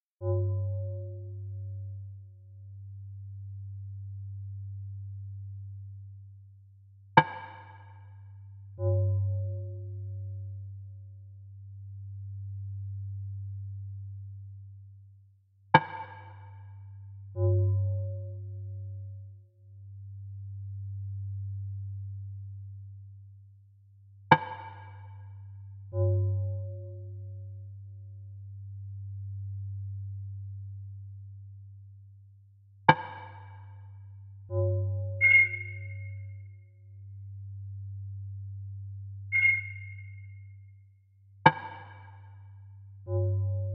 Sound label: bells and whistles